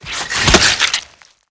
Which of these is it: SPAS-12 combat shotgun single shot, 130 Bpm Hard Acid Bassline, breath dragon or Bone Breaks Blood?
Bone Breaks Blood